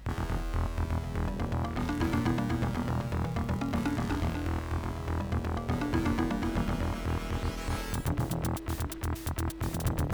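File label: Intro, ES488, ES1868; ESS1868; descent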